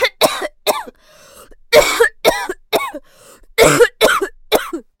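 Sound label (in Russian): непрерывный кашель мучительный, ребенка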